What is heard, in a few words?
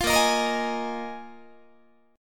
A sharp 7th Suspended 4th, Guitar; A#7sus4; strummed; 20,18,18,20,19,19